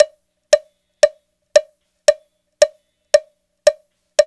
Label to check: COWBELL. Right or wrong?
Right